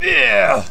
death; player

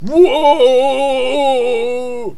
falling; player

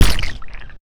gib

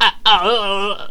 death; player

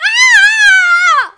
falling; player

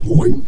fall

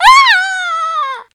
death; player

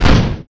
obelisk hit

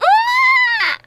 death; player

